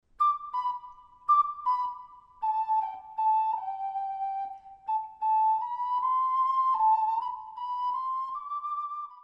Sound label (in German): Kuckuck, Ocarina